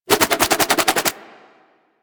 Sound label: Nunchuck Quick Spin Whoosh C, Infested, Weapons; Warframe